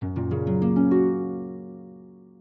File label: harp; lottharp; lottblocks